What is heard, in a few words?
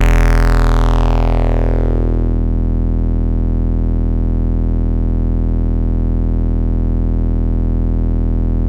bass